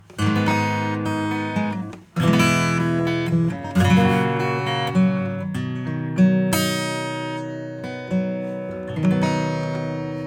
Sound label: Spectre acoustic; Standard